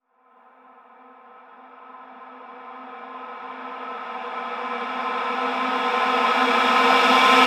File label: FX Uplifter; Mutekki Media; Vengeance Electroshock Vol.2